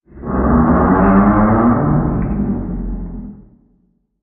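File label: Creature Warm Up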